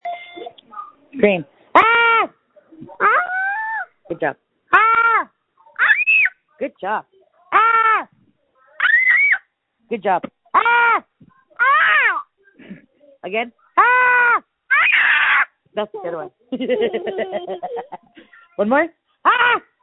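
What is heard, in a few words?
screams that feature laughter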